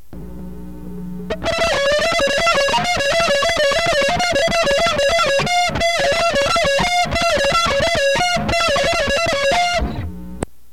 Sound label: guitar three